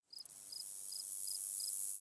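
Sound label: Pets Insect Large Idle; creatures pets; Lego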